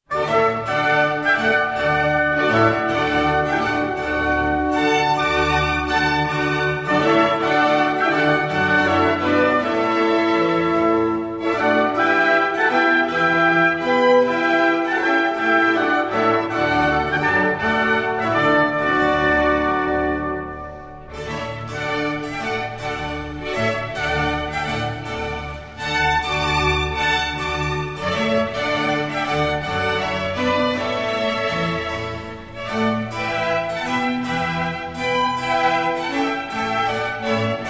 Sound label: Water Music Suite No. 2 In D Major